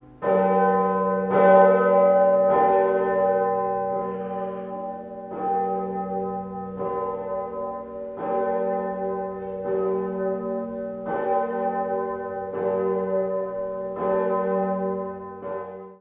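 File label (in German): Grossglocke Klöppel mit überlangem Vorschwung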